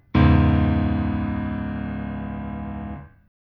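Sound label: C#m lowest, chords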